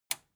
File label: metal button tiny push enable